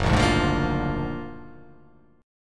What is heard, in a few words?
F sharp Augmented Major 9th F sharp